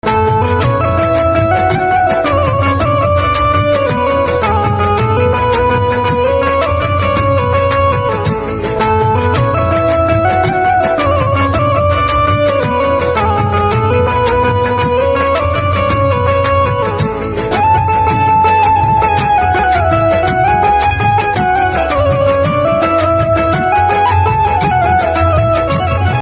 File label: Banjo Instrumental Ringtone; devotional instrumental ringtone